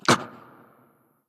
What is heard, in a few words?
hitreact human male small